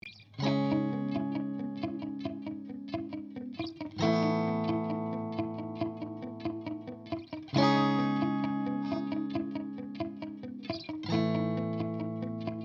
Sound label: Unreal Guitar Sounds